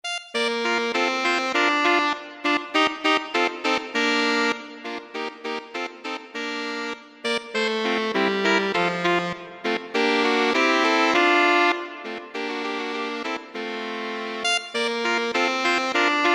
Sax, saxophone trio; Allegro; mozart

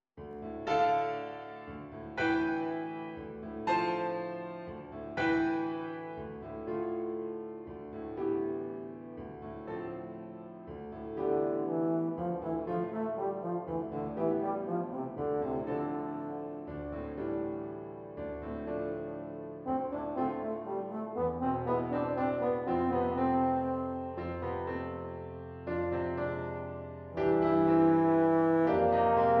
Beautiful Little Gem Euphonium

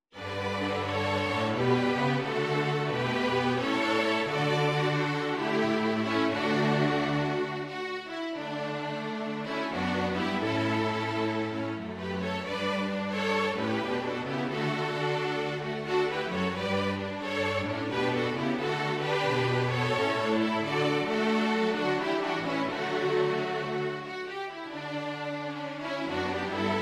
jazz String; the first noel; jazz arrangement

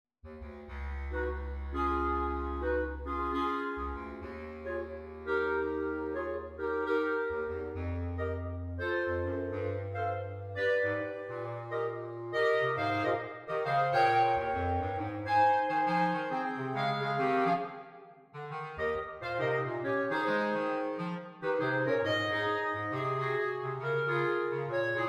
clarinet, clarinet quartet; Country Garden

